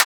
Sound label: Transient; FLPRO